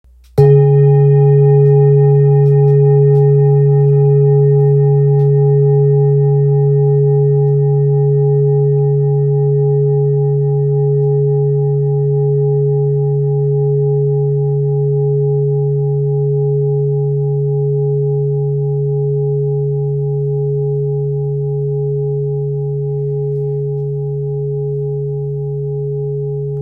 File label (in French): bol therapeute do diese 1.46kg